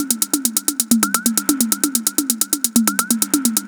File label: top130 entrance